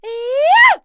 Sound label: Vox Casting; en; voices; heroes; Laudia; kings raid